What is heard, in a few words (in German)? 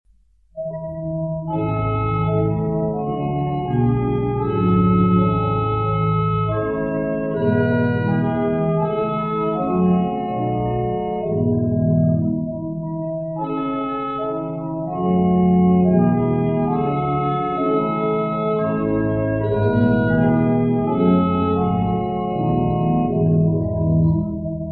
Orgel; preussen; ostpreussen; Kreis; Johannisburg; Bialla